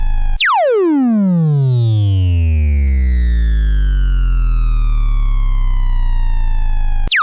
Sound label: sweep, 2A03